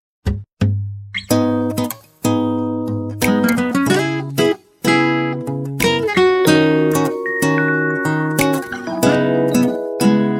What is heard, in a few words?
Nylon Guitar; Elephone U Pro; ringtones